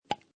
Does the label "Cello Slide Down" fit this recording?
No